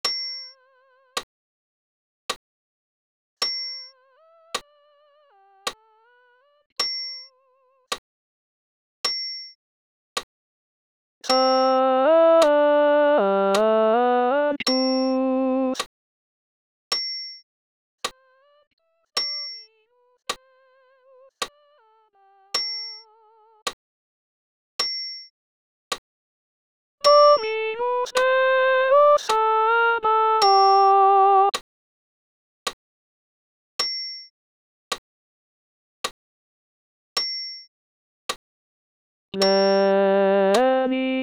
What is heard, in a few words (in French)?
Sanctus alt voc seul